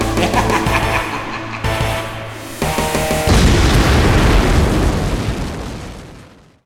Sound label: Victory Sound; escape from paradise city